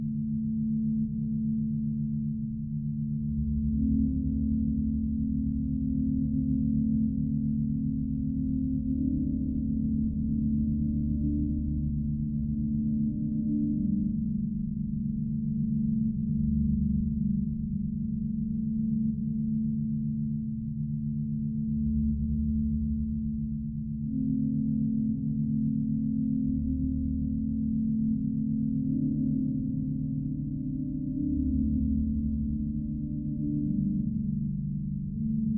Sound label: tree level drone